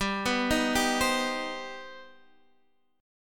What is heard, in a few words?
C Minor Major 7th G